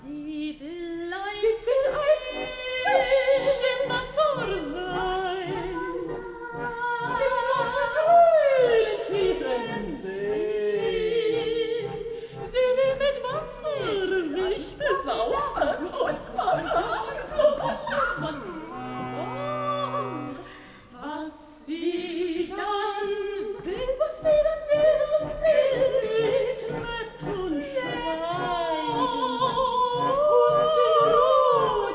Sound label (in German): impro fisch im wasser live